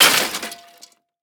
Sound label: plastic drop metal, plastic and glass debris fall on metal surface